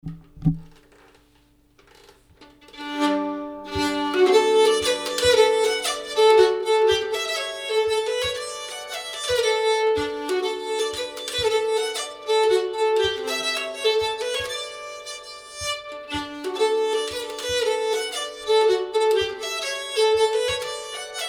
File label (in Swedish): polska; Björnmosen